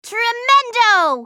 ultimate effort; Lulu

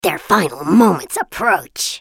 attack; Kennen; Character Voices - English

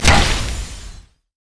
sonicwave; LoL; leesin skin05

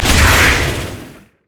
explode; LoL; velkoz skin01

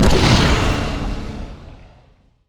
spectral; LoL; yorick

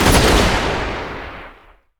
cluster shot; LoL; graves

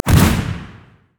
frost recall windup; LoL; shyvana

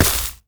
nashor attack oh; LoL; monsters

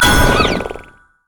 shield; lulu skin04

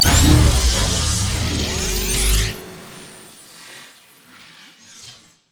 upgrade; Cyber Ezreal

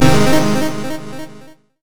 crescendo; sona; paxsona

